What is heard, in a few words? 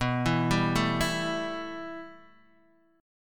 B 7th Suspended 4th Sharp 5th, Guitar, DADGAD